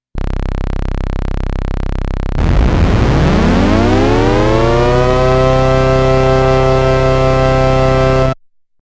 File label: va saw